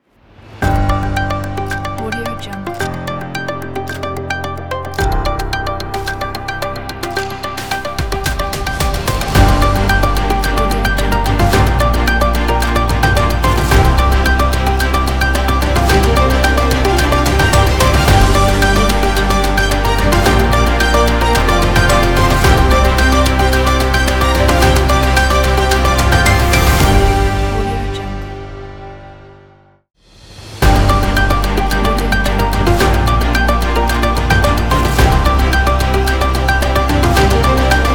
news opener intro ident; puremusic

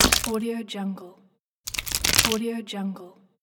a slime splat

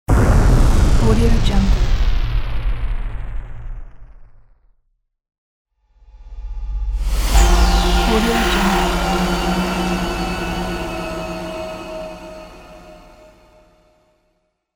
shutdown pack

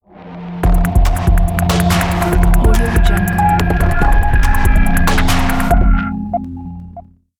intro glitch logo; centralperkproduction

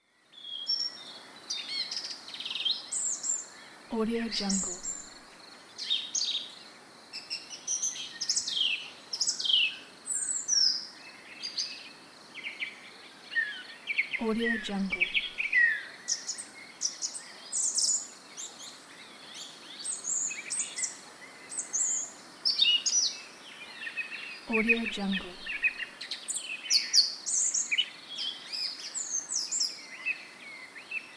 forest

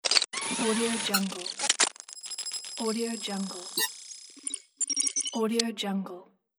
futuristic device

